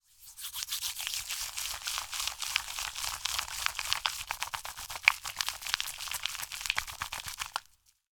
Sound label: shaving gel lather in hands